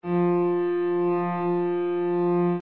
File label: piano f3; nexus-js